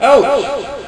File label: Ouch